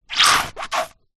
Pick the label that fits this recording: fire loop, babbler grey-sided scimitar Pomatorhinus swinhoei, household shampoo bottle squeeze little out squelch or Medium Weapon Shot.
household shampoo bottle squeeze little out squelch